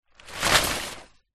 paper scrunched up several sheets drop from 1 foot